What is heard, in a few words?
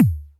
Toms, Elektron MachineDrum SPS1 MKII